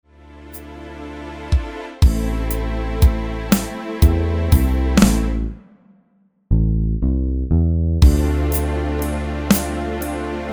excerpt backing track slow speed, guitar; josep suller; lick of the week no 33